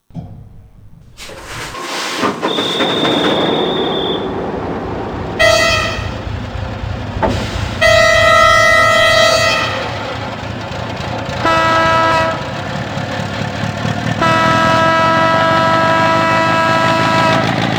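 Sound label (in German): Hörprobe MaK 1200D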